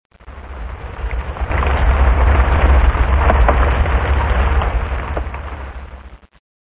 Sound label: rain voice